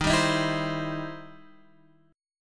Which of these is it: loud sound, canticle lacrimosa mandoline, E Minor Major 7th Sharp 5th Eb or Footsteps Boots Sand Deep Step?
E Minor Major 7th Sharp 5th Eb